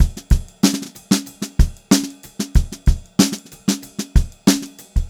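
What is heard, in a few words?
STRAIGHT VARIATION, Funk-Groove Drumloops; USB Soundscan vol.38; AKAI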